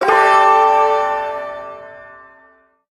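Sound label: Shawzin Pentatonic Major Chord; Warframe; Duviri Ersatz Shawzin; Chords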